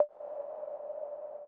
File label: Conga 808 Verb